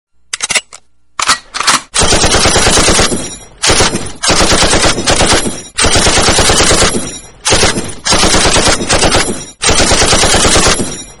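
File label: gun ak47, ak47 sound effect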